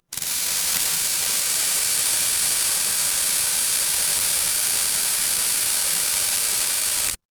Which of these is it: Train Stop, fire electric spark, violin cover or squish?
fire electric spark